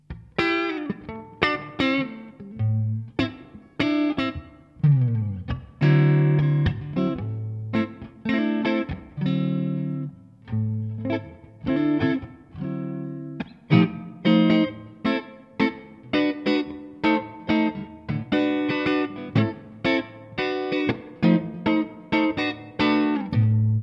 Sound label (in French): rock jazz 2 compresseur